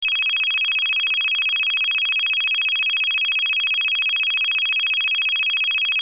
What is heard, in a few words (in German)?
ton, Signalgeraete